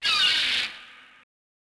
seagull; Splashdown; XBOX; Soultree; Hawaii

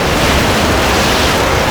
slide plastic; terrains; Tony Hawk's Project 8; XBOX

